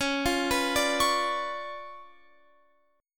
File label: Db 7th Flat 9th, Guitar, Open F; x,20,17,18,17,20; Db7b9 arpeggiated